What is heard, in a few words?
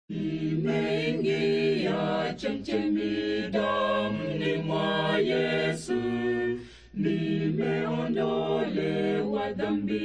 songs swahili kenya